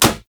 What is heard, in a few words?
Melee Fist Impact Generic; Warframe; Fists